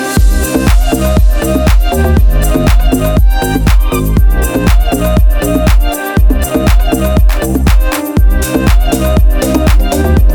Music Ringtone

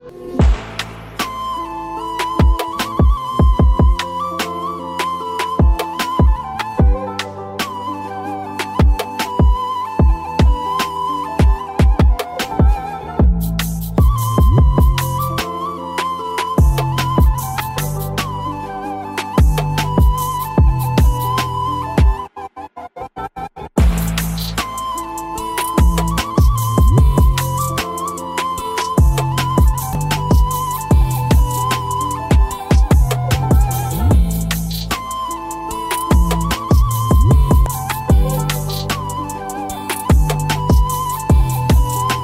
Romantic Turkish Flute Ringtone

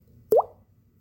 Notification Ringtone; Realme C55

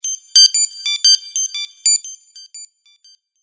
Cell Notification; Nokia

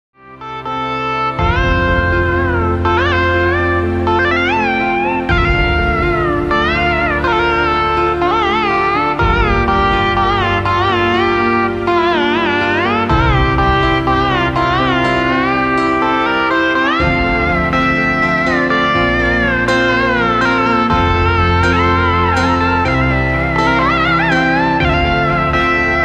Indian Theme Ringtone Instrumental

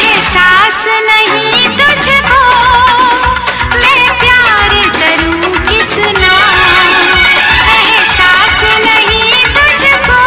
Sad Bollywood

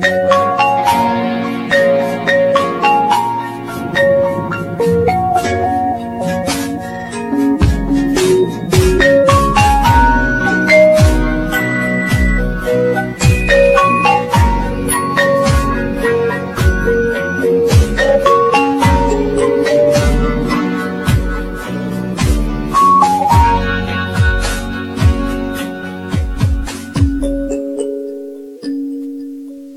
Vivo Original Slow Motion Ringtone

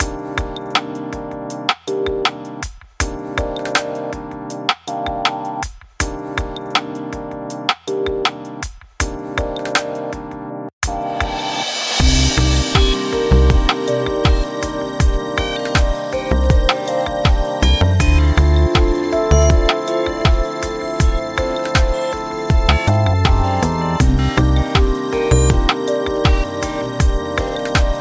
Blackberry Classic Ringtone

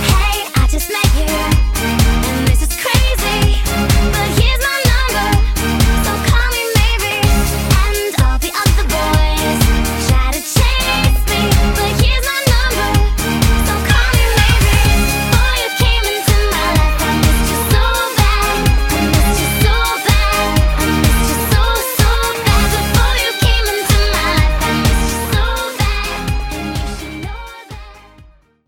Ringtone; Samsung Galaxy

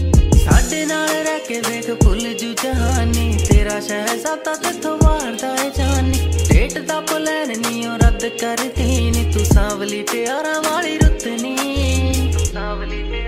Punjabi ringtone

